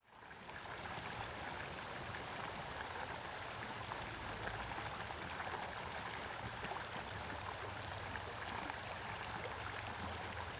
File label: Ambience Stream; AdobeCC